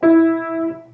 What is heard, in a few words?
low E